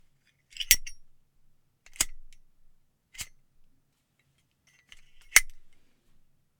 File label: Lighter